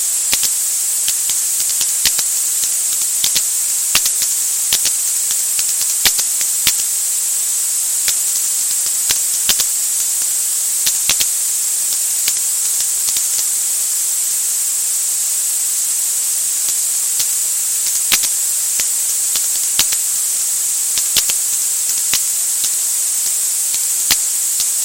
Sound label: recording ultrasound keyboard logging